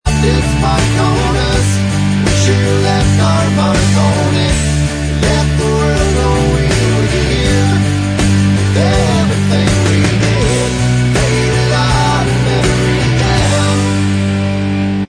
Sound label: Bvox Track, backing tracks